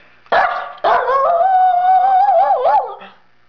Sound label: woo, eskie; Peppermint